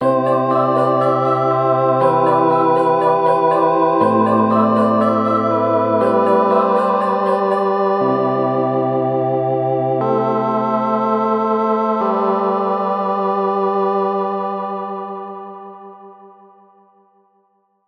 This track was created using Soundtrap sound effects, keyboards, and loops; stinger